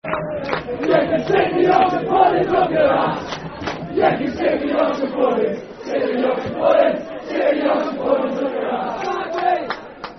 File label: Stick Yer Yorkshire Puddings, Football Chant (Soccer Songs); Darlington Dfc